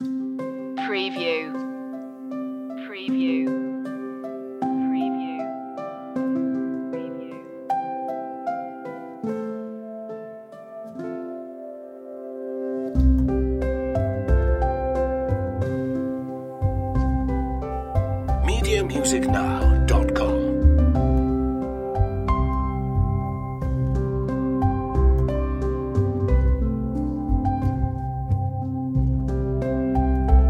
sing me a song stripped down mix

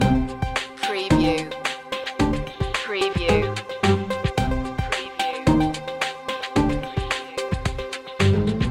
tango chill loop

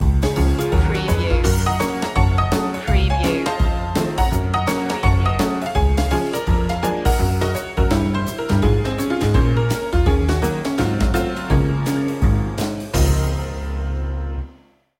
ragtime promenade

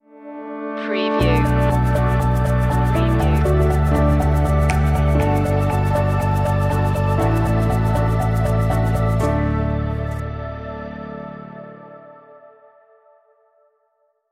all good people stinger

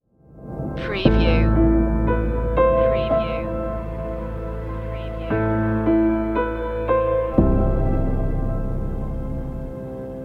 piano drops sting

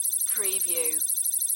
Data Beeps Readout

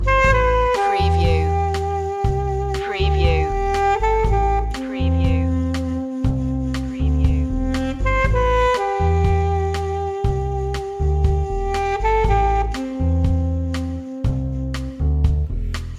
loop

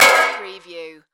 Impact Metal; Action, Impacts & Explosions

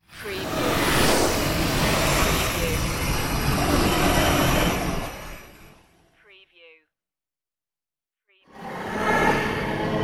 starship platoon flybys

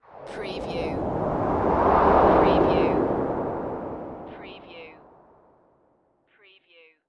Space Whoosh Right

